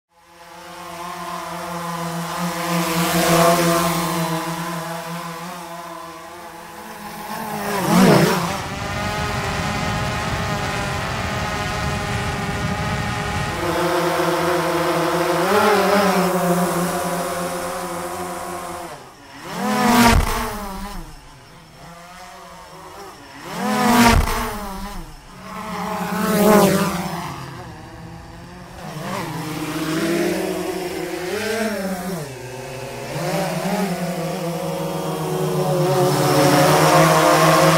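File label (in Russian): звуки полета квадрокоптера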